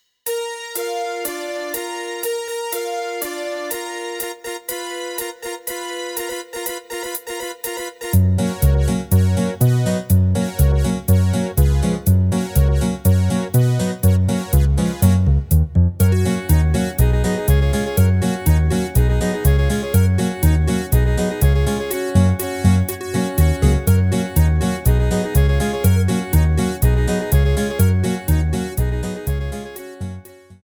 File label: MIDI